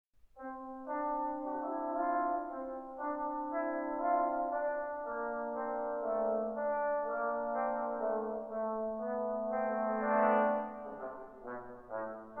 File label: trombone